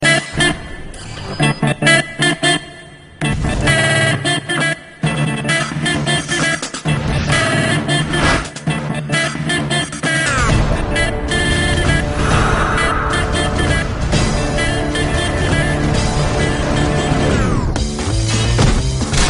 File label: guitar; rock